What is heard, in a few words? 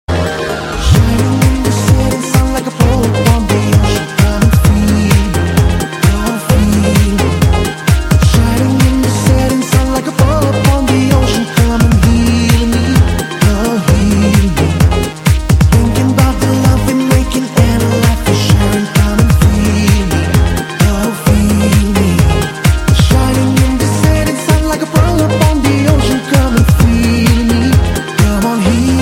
Remix English